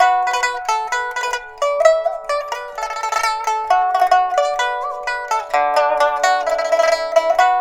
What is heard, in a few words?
PIPA, 90; INIS - Opium